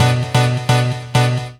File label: Stab; Blu Mar Ten; Jungle Jungle - 1989 to 1999 Samplepack